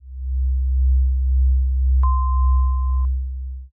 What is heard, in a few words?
final tension